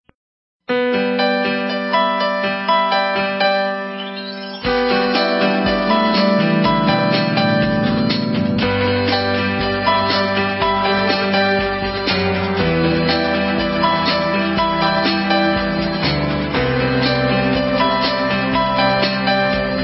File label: new iphone